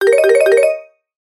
app alert tone

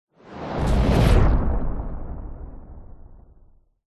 cinematic whoosh powerful flame like; sound design

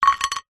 biscuit set down on plate

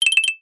cartoon blink shake flutter high pitched; zapsplat

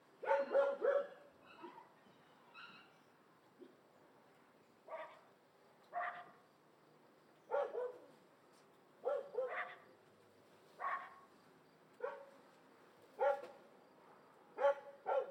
Dogs barking, distant perspective; Sound Ex Machina

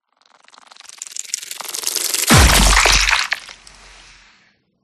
DESIGNED CINEMATIC TRAILER GORE IMPACT; PMSFX

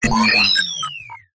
science fiction robot shut down

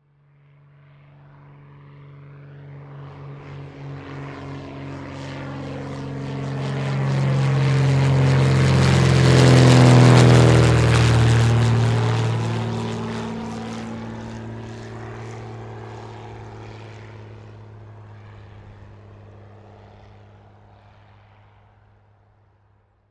Airplane Prop Plane; Blastwave FX

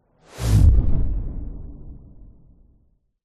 science fiction missile whoosh pass by hit

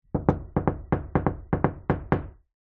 household knocking door wood

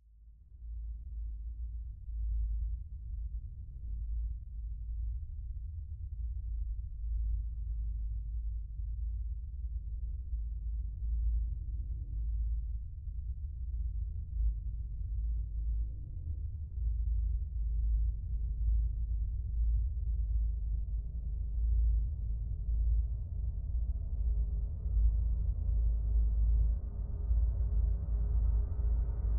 Swell To Impact Rumble; bottlerocket

